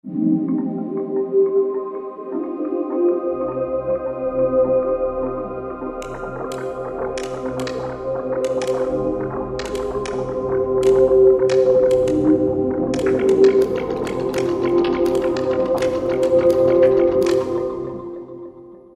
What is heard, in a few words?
pad Out of Time, E-mu PK-6